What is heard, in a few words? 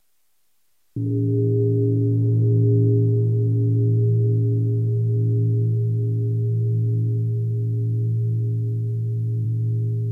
gong; Full Moon